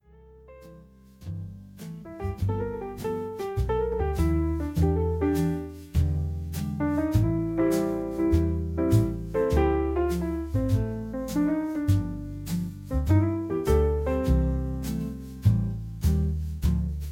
Dinner Jazz

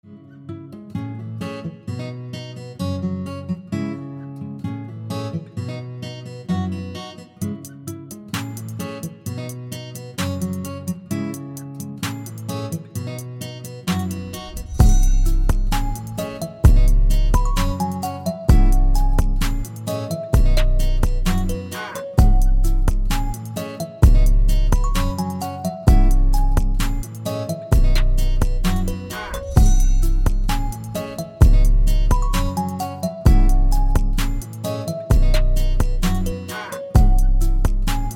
Easy Instrumental

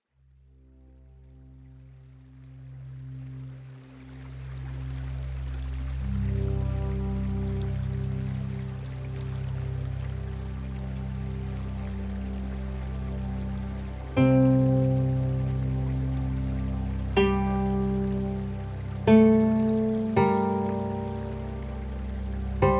Chillout Lounge White Noise Sound Garden

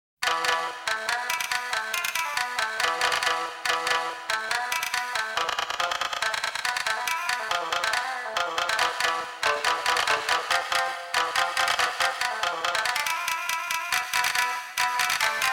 Shamisen; Star Ocean Second Evolution Original Soundtrack

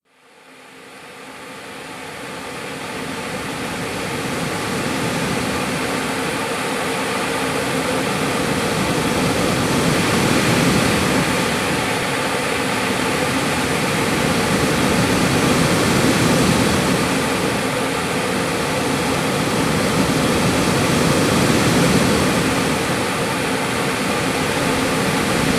Static Noises

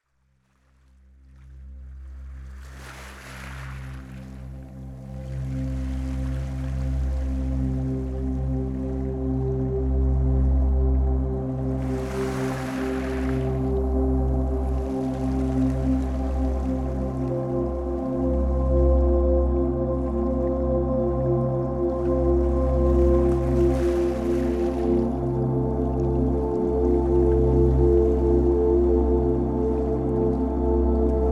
Rain Sounds

